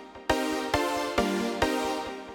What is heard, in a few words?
Chords; simple session